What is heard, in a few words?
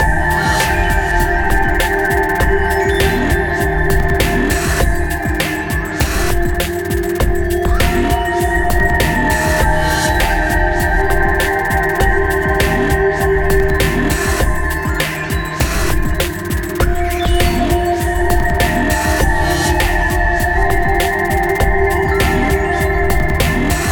Music Main Menu